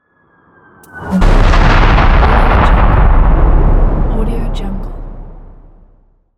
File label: aerial bomb explosion